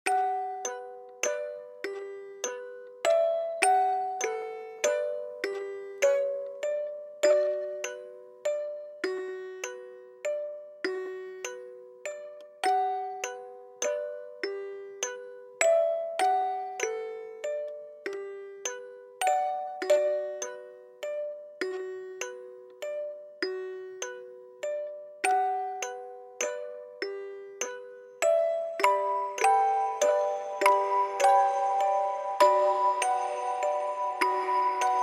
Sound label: around the music box